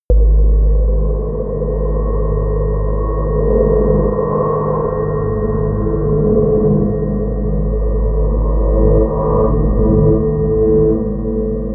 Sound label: drone sonar